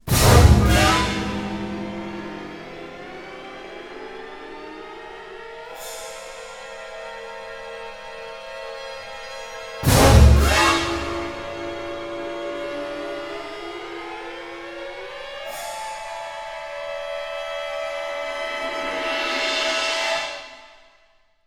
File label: STRINGACCENT, Orchestral Loops